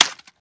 reload fast magout; gmod; viper

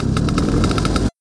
gokart start; freeman; vehicles

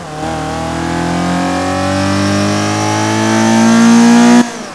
second, vehicles; gmod; tdmcars; golf2

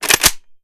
reload empty fast charge; weapons; gmod; viper

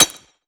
frag physics bounce concrete hard; gmod; viper

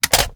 ar reload fast mag out; gmod; viper

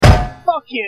hit head; bmw isetta

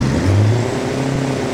first, vehicles; bus

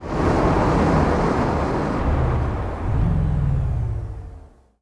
throttle off, vehicles; gmod; tdmcars; prius

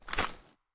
ads up, weapons; wfoly ar; viper

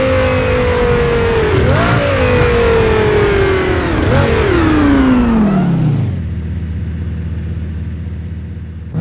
v8 throttle off slow loop

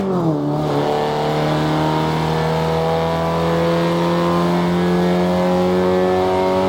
third, vehicles; ren meganers

